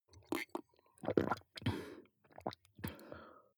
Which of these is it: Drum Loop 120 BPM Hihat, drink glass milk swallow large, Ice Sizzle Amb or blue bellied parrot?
drink glass milk swallow large